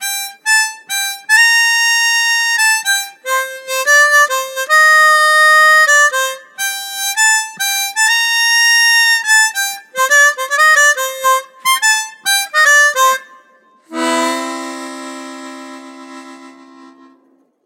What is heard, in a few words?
Hohner Hard Bopper